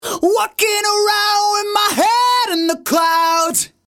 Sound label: cloud scream